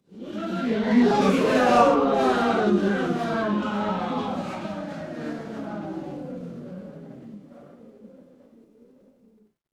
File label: crowd gasp